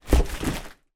foley large cardboard box taped item lean against wall